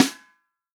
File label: REAL DRUMS FUNK KIT